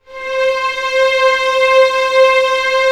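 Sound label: STR C4 FF, Orchestra Strings; Sound On Sound - Peter Siedleczek's Orchestra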